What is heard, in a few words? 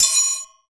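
PER PROCU, Procussion, percussion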